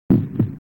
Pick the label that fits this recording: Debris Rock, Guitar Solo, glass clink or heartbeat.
heartbeat